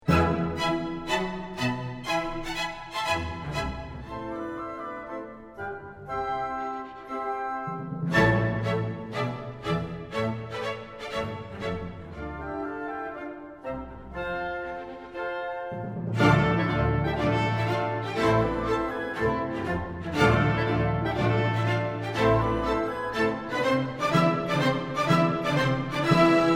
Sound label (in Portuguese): filarmonica Andante